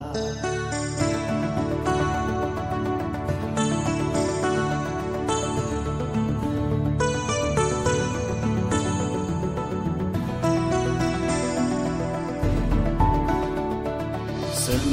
bhutanese song ringtone

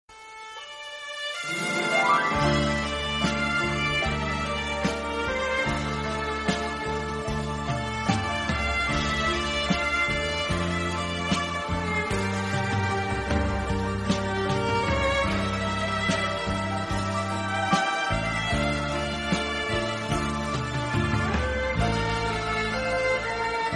italian violin; ringtone

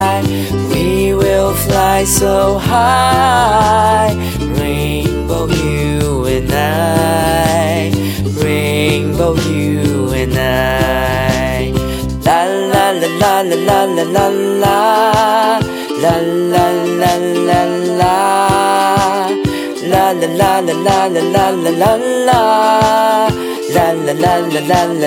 singaporean ringtone

